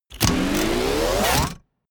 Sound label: wind up